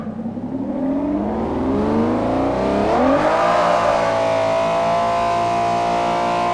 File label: rev, vehicles; TDMCarpack; TDMCars